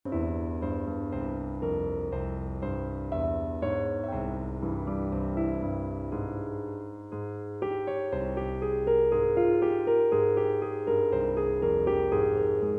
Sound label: Piano